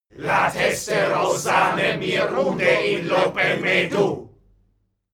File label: male crowd evoke